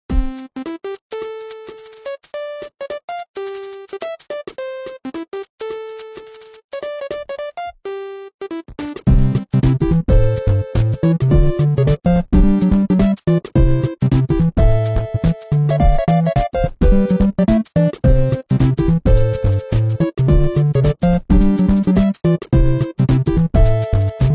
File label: flower garden fun land; super stickman golf 3